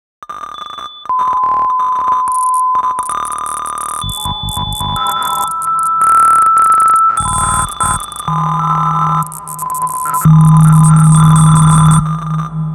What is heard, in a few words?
760bpm